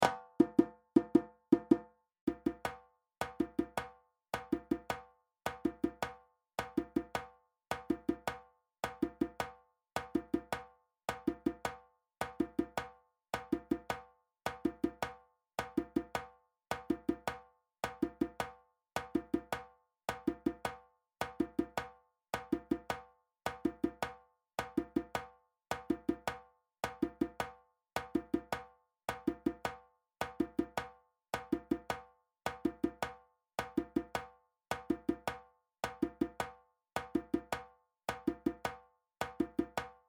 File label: Djembe